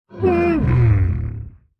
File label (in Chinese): 待机声; 嗅探兽